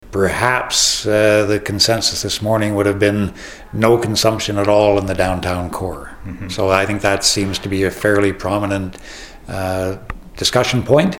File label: Tom